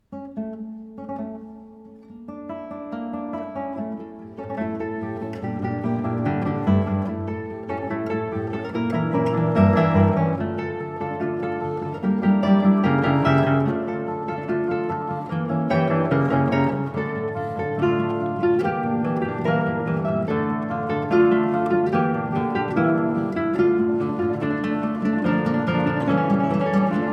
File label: Guitar Quartet